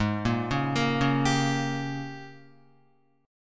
G sharp Major 7th Suspended 2nd Suspended 4th Guitar; arpeggiated; JGuitar